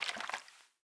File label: water bottle slosh; Rust